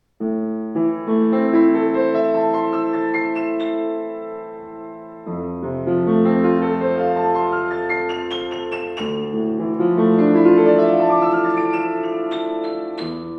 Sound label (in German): Grotrian Kreuz, Klavier; 116 grotrian steinweg carat schwarz